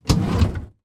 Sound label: household empty chest of drawers drawer open inside; zapsplat